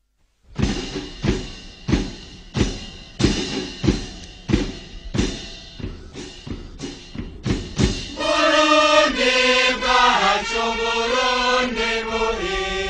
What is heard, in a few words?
Burundian anthem